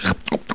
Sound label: get point